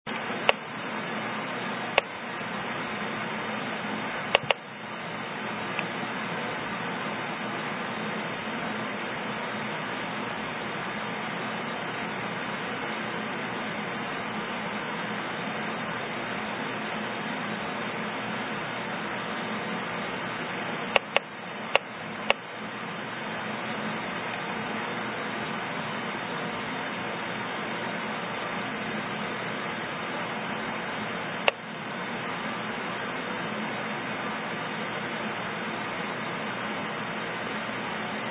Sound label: Background 1 minute